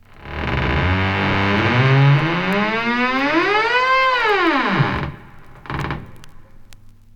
heavy creaky door opens

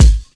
clicky bass drum one shot g sharp key

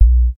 rich low end bass drum sound b key

